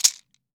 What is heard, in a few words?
MARACAS; AKAI S-Series CD-ROM Sound Library VOL-5